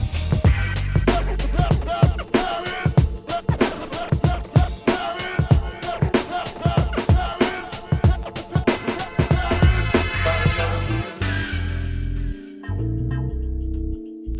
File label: Remix Instrumental